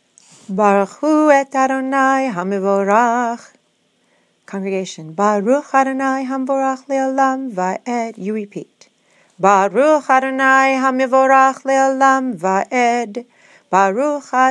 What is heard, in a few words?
Torah Blessing Up Tempo